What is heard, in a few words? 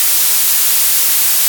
tv static noise